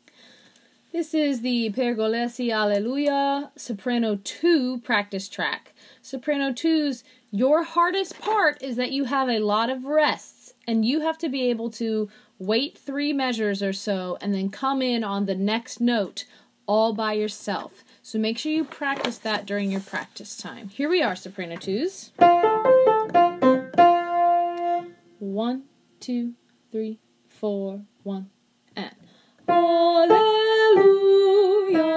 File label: Soprano; Pergolesi; Alleluia